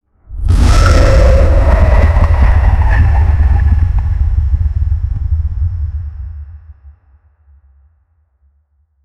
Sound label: t rex roar thunderous gut, prehistoric